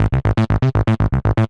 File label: Cambia, Analog Bass; Ableton; Factory Packs; Retro Synths; Analog Bass Vol 1